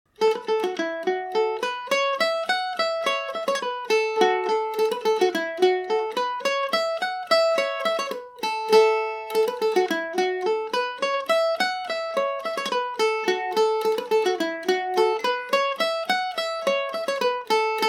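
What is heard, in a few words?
A major, irish mandolin; munster bank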